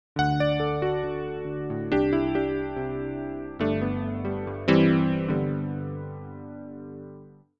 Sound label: electric Grand